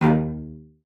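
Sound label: CELLO spiccato D#5; Xsample VOL-5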